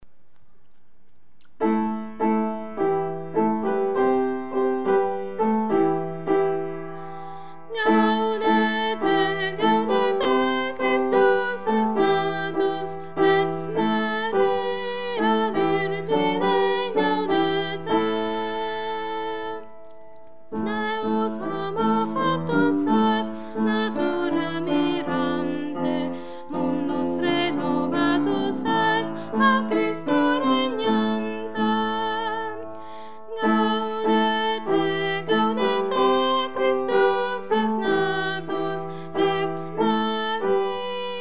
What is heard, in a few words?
gaudete soprani